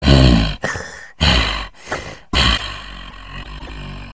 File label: breath; slender